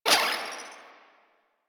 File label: Pick Up Health; Warframe; Caliber Chicks minigame; pick ups